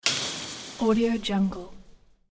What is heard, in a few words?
wire fence